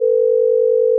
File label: 500 Hz plus 450 Hz, 50 beats per second